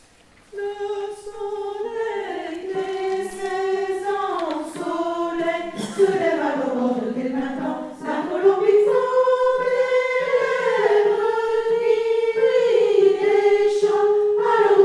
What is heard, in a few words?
Fin Sopranos